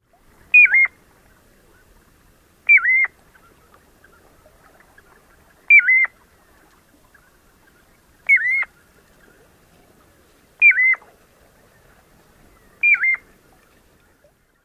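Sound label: Piping Plover, peeplo calls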